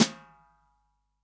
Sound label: SD, REAL DRUMS, SWING KIT